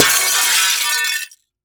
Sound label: Glass Break Pane